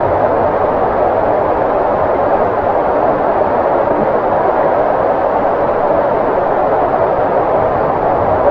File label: wind; fgdata